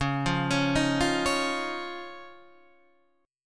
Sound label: Db Major Add 9th, chord, Guitar DADGAD; arpeggiated; JGuitar